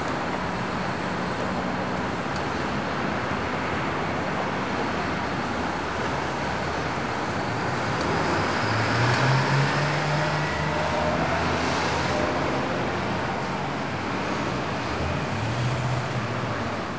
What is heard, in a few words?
city sounds